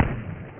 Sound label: Apache Shot; shadez